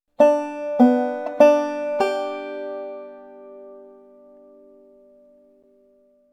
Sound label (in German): das drop thumbing